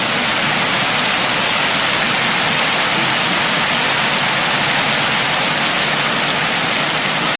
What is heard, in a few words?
rain